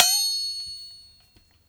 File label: FLEXITONE, DUB FX; Drumdrops In Dub VOL-1; SINGLE HITS